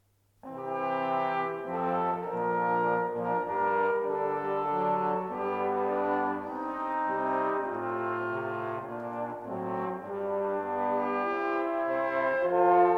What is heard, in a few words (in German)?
Ricercar, Festmusik der Renaissance, Bläseresemble; Ricercar aus Fromino Dialogo